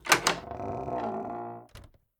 Door squeak creak fast; Devour

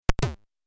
Kick; 1st division manager commodore 64 gamerip 1993

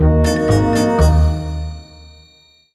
Exiting Vehicle Jingle; The Simpsons Hit & Run; PS2; Sunday Drive

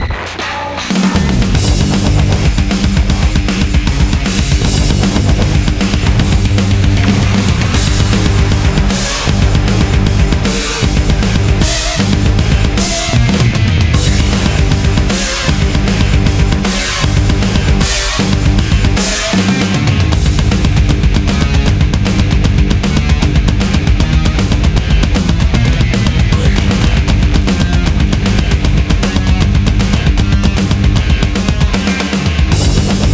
Heavy Machinery; Jetpack Joyride 2

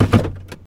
Gearshifting; car simulator 2

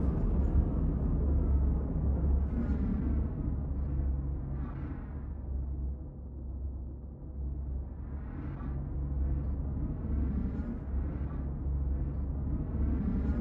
Customisation Sfx; LEGO Worlds Monster Pack; Cutscenes

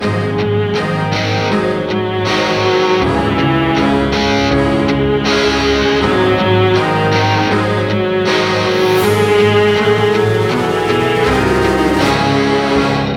Victory (Lose); Skorost Victory (Lose); Speed Racer The Videogame; PS2; Wii

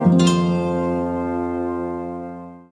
Settle Great Person; Civilization Revolution

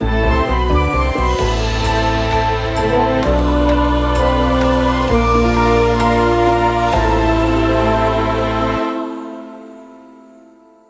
end fail; the sandbox evolution craft; android

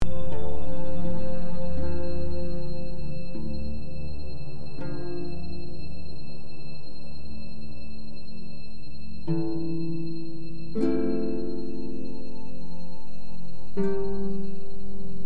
Neutral (Loop)

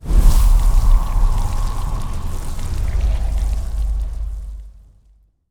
shadow vanish corpse

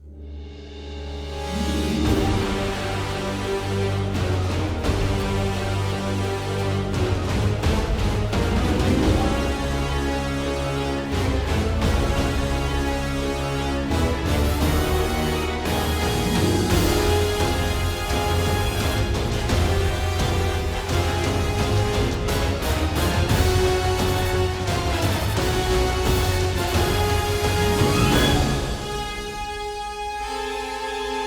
Intensity Blast; bayonetta 3

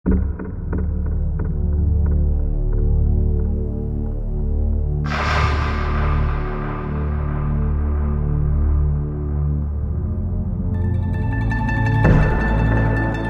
Spaceship - Ambience; lossy; Transformers Armada

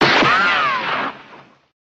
cowboy shot

